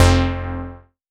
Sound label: Synth Cuts; Vengeance Samples; VES1 Instruments